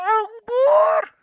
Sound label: Death; Angry Goy II; Muslim